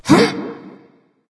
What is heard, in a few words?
grunt lift; smite; voicepack; hel